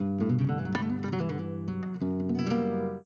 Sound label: Flamenco Flair, ringtone